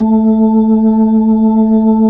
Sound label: B-3 A 4, Jazz; Keyboards of The 60's and 70's